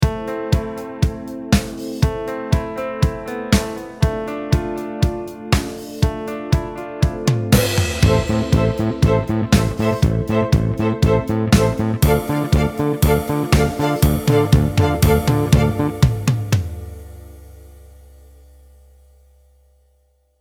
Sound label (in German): mix ohne mstr EQ